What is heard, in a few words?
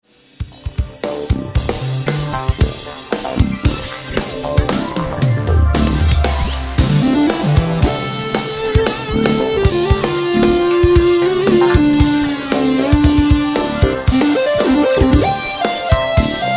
Rainy Garden, Roland xp50